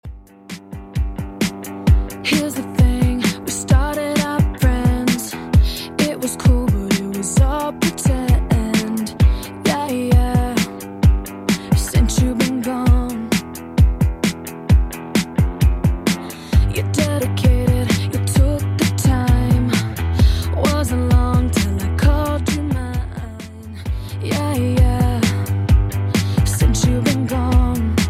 2ks Redrum 131bpm

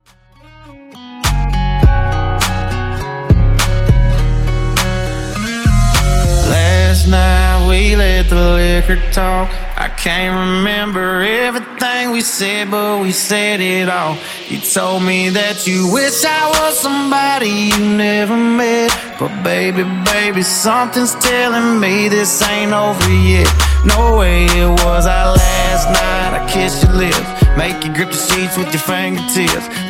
transition

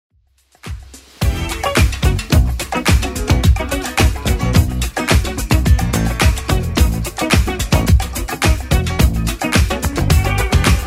80s Club Edit 108 bpm

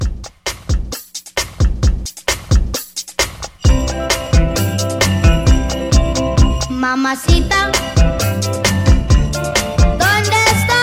Christmas Re-Drum 132

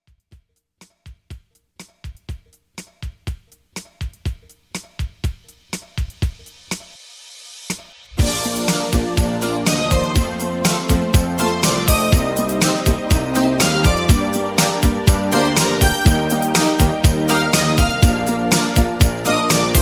80s Dance Pop ReDrum 122Bpm

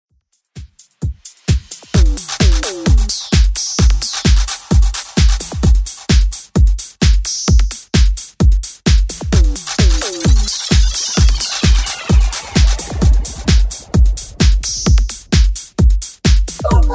80s DJ Intro Outro Redrum 130 bpm

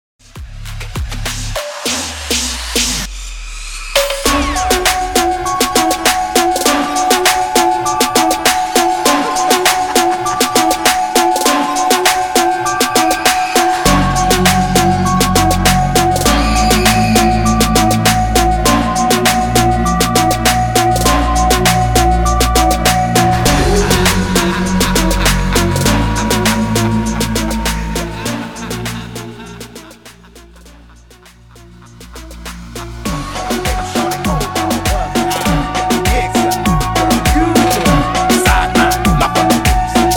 HALLOWEEN 100 BPM

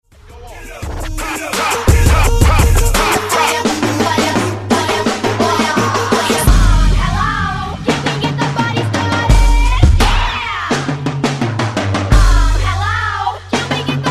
85 122Bpm; Wordplay Transition

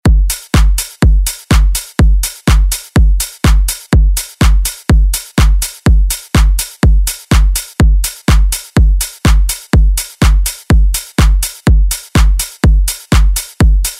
Pop Dance Redrum

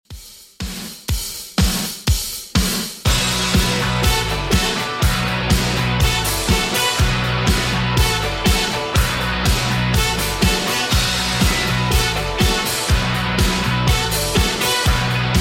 80s Rock Redrum 122bpm

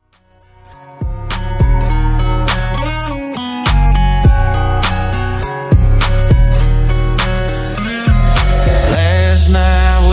Redrum

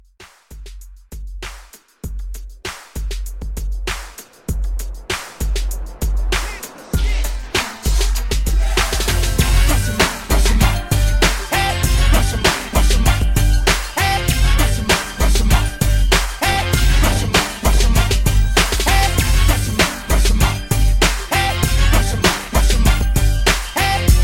Old School Mashup

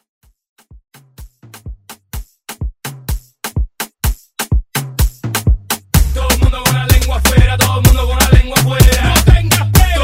Latin Hype Redrum

